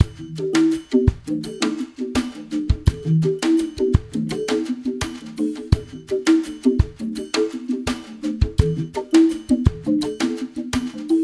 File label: REMIX; Spectrasonics; Liquid Grooves 1 & 2; SAUNTER